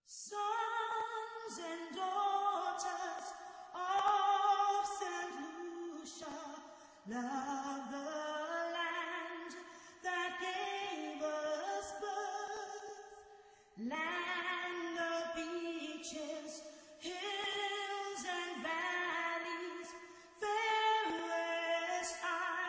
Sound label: Saint Lucia National anthem Vocal